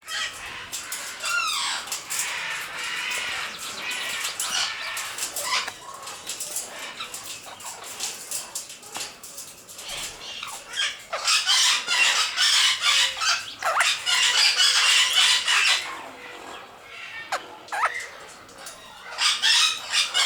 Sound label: enicognathus ferrugineus ferrugineus